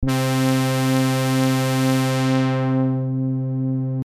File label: LPF